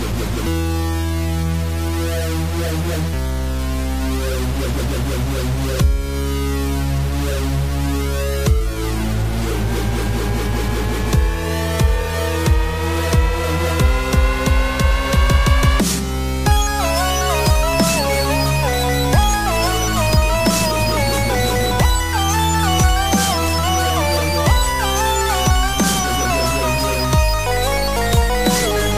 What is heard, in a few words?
dark anime dubstep